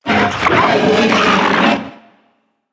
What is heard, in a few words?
HUSKED CRAWLER dead